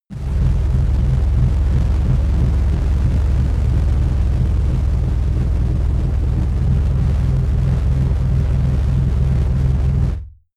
noise rumbler; musikelemente; drones

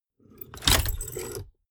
mechanics medium snap; industrie wirtschaft; mechanik

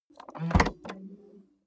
car bmw e46 exterior trunk open

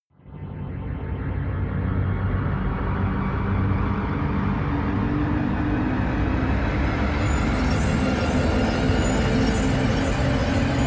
jet flyby, risers

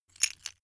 metal pieces clatter short

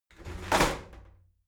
shower slide doors open heavy; objekte; tueren

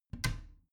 bathroom cabinet warped close soft; objekte; tueren

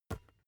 basketball catch gentle

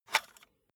cassette case open hard; objekte; kassette

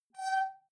beacon flash high, signale

